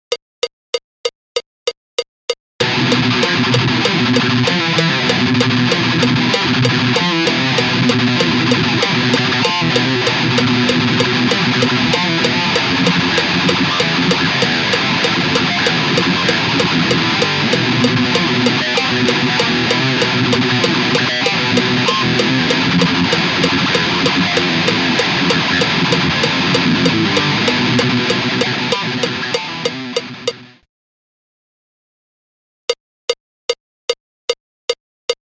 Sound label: thrash